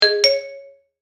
Multimedia Positive, Technology, Multimedia, Positive, Element; Alert; BlastWave